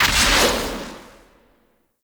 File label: Jump Attack Start Whoosh; Warframe; Zealoid Prelate